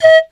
BAMB SH, BAMBOO FLT

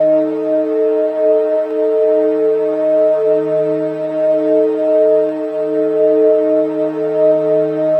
Drone Ambient; Drums & Drones

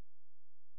C#, Synclav FM, FX; Big Fish Audio

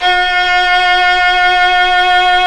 Synclavier Strings; ILIO

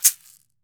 CAXY, CAXIXI; AKAI S-Series CD-ROM Sound Library VOL-5

